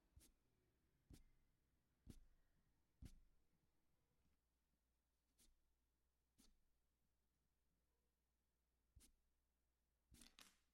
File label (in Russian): chistka nozha no mozhet ispolzovatsya dlya zvukov rezki i udarov